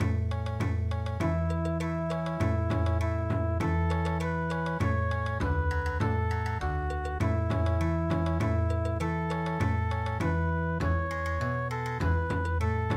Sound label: Bon Odori